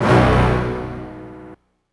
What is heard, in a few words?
Hit